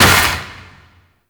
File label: Mech Shield Crack; Vehicles; Abilities; Thano Mech; Warframe; Nechro Mech